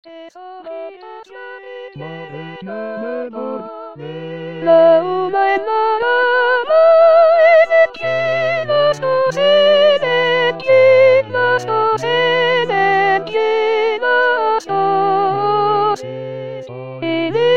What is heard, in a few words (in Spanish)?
treshojitas T voz, con voz; Coro "Con dos Bemoles"